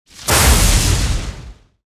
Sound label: Beamgun Plasmagun; Weapon